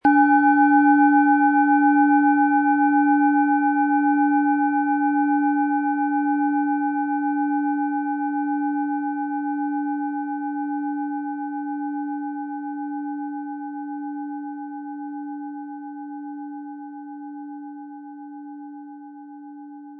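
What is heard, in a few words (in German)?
Planetenschale Mars mit Klöppel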